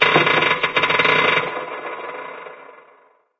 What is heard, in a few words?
metal groan